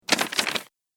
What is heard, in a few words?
Rough Paper Noise; Paper Sound Effects; office